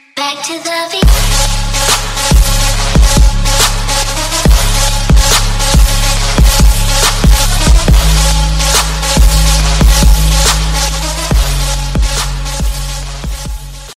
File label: ipnone x plus electro ringtone